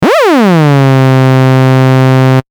adsr

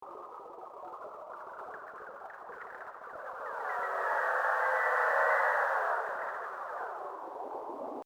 droplets

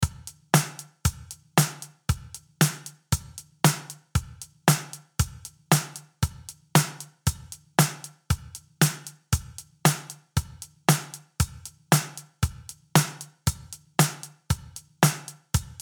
Drums

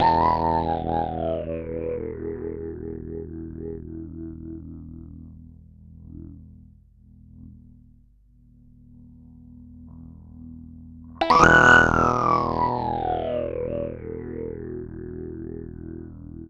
bass balls

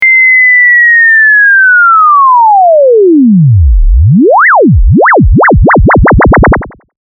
sine

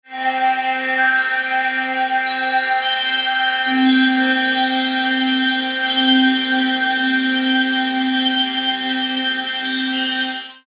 tanpura

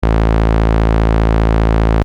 bass station saw

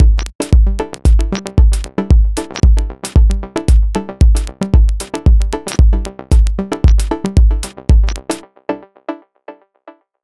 Drums and Synth

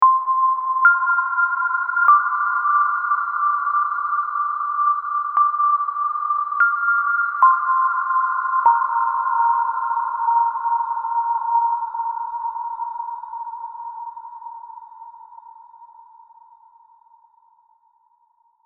Einfeld Sine

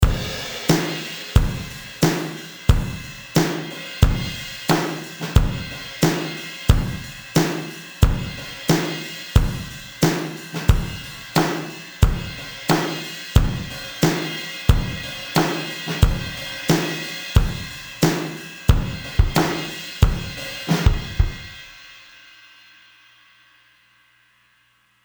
Drums

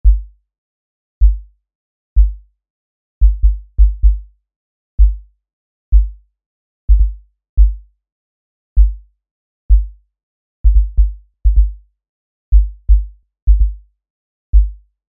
SUB KICK

